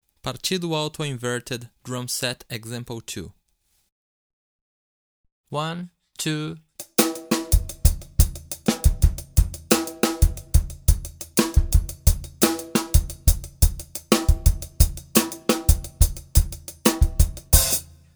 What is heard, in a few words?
Partido Alto Inverted Drum Set